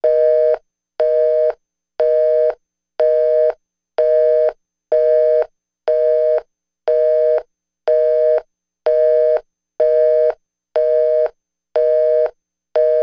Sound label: busy signal